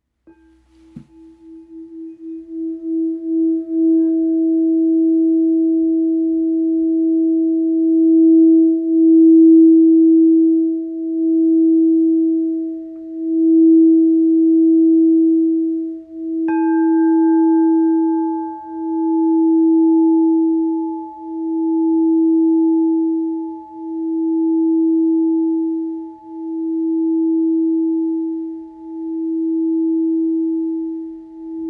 Bol cristal thérapeute mi 19cm

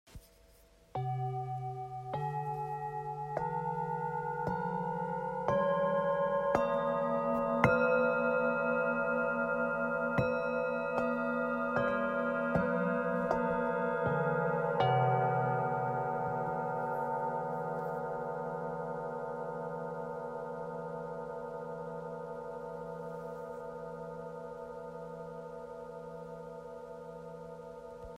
Set 7 bols orissa

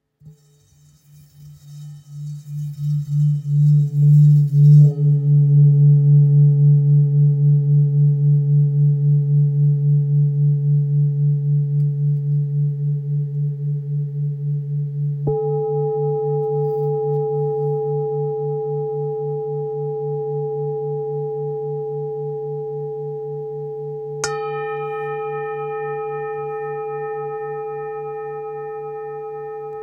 Bol ancien re

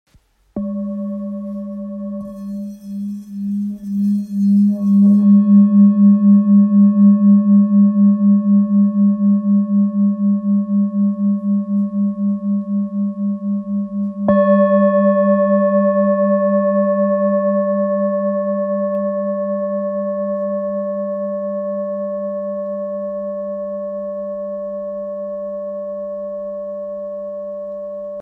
Bol ancien sol

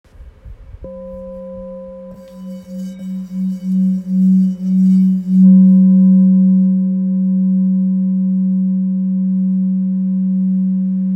Bol ancien fa diese 1.005kg

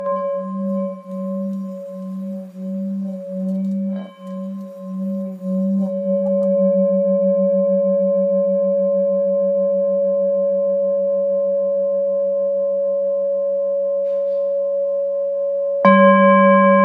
Bol bengale Fa dieze

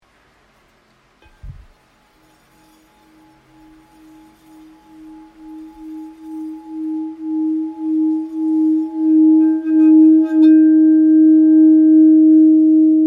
Bol cristal alchimique gold 7 pouces ré dièse

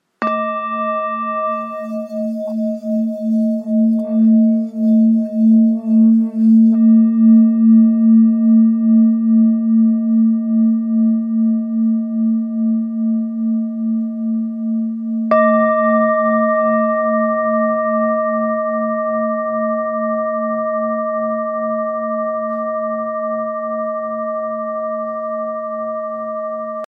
Bol ancien la

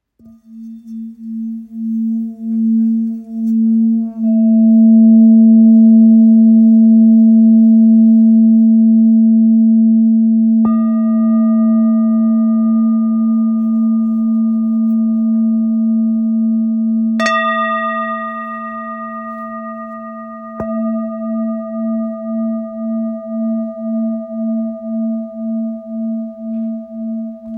Bol full moon 675g la

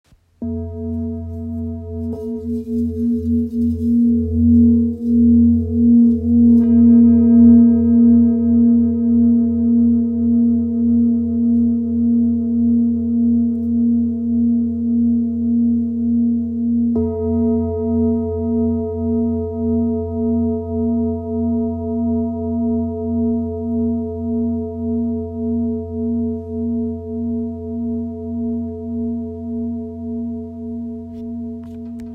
Bol ramgandi la diese

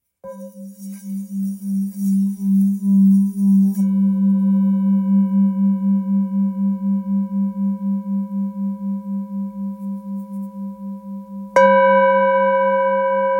Bol ancien sol

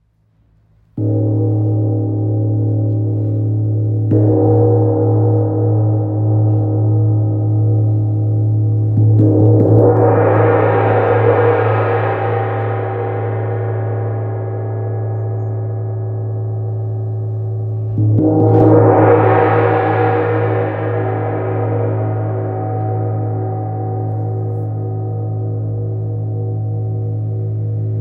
Wind gong 55cm sol dièse

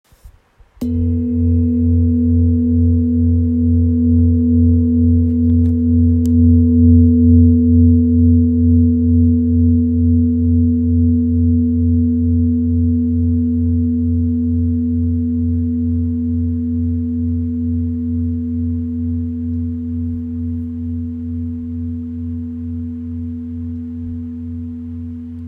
Bol ramgandi fa

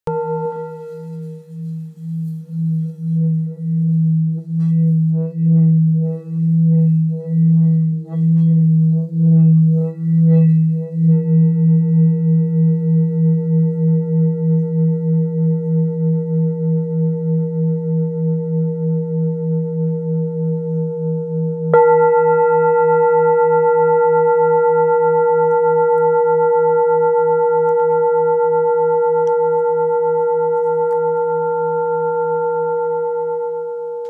Bengale la diese 1.5-1.6kg

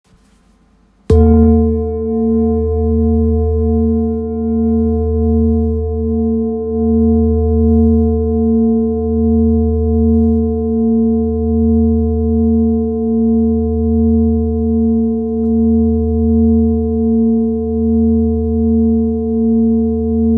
Bol therapeute mi

